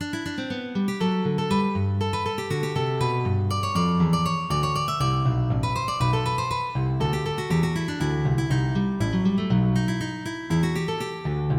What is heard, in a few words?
Rotta della Gagliarda, MIDI